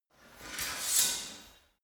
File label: sword scrape